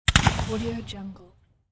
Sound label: musket rifle fire; transitions and movement